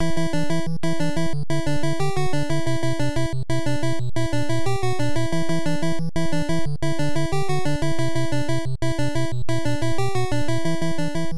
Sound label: Boss BGM; Transformers Convoy no Nazo; Family Computer